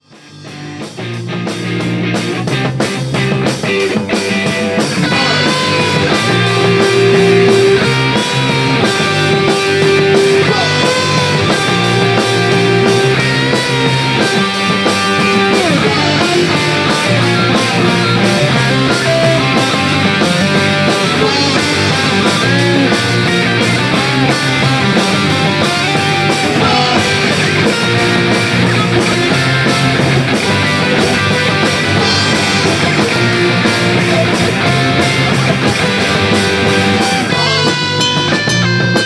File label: Swamp Solo; TheSwamp